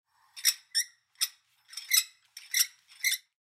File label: Ship Pulley System Squeak Shake; Transportation Watercraft; Boat Sound Effects; Blastwave